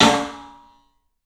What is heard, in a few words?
club snare, 3D Drums; 3D Sound